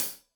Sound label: Hat Tight, Hi-Hat, Cymbals; Deeply Sampled Drums